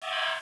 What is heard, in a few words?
homing shot buzz